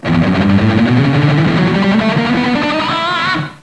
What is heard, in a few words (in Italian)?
suonerie musica rock